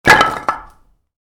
Wood drop Small, Wood: Pieces Drop Onto Concrete, Light; Materials; Wood Sound Effects